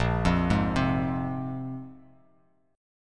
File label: Bb 7th Suspended 2nd chord; Bb7sus2; arpeggiated; 6,8,6,5; JGuitar